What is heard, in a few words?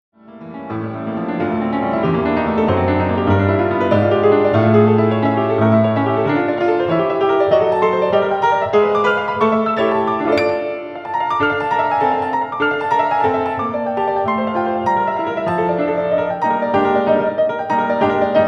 Piano; Schumann; Kreiserliana Mvt. I